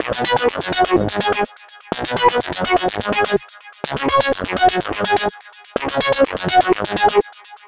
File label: gobbledom B 125, synth loop; Zero-G; Soundclash; Nu Dance Electro